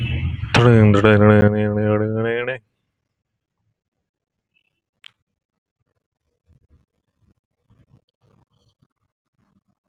Music Musical instrument Percussion Drum Snare drum Drum roll, vocal imitation